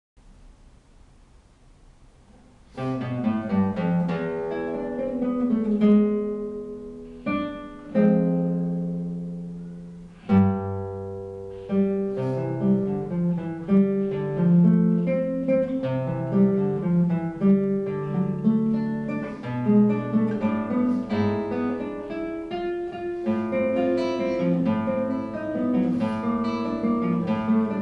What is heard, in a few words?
prelude guitar; Manuel Ponce